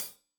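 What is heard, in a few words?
hat closed, hi-hat cymbals stereo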